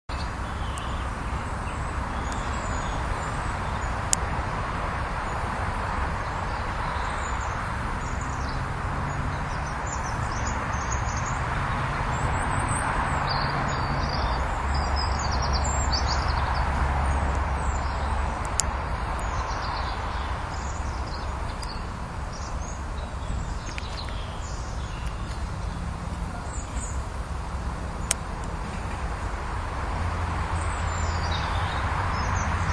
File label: birds singing at samlesbury hall